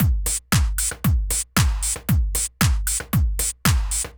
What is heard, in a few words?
Drumloop 115bpm, Nu Disco; Zero-G